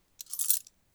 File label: coin clicker, coin sounds